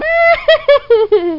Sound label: laugh